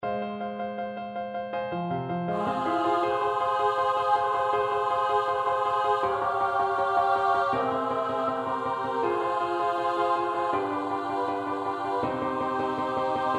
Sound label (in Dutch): S-A-T-B, koorpartij oefening; Elgar (Edward); Scenes of the saga of King Olaf